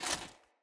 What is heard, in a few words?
Foley Action Armour Run; Characters; Mother Armour